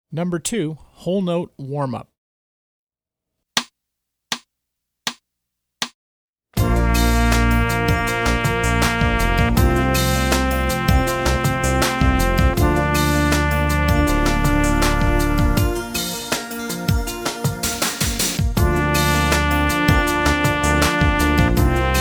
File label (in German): Whole Note Warmup Trompete